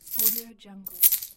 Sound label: handling coins